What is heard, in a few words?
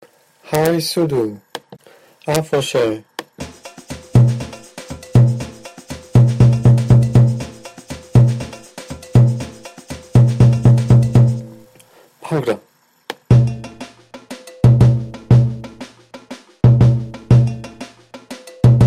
High Surdo